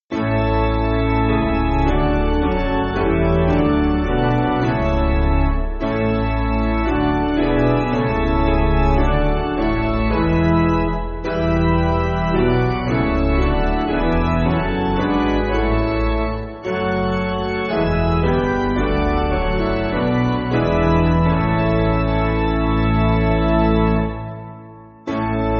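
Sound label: Piano, Backing Files for Churches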